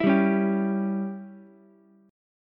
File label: Db Diminished Db down Ukulele; strummed; JGuitar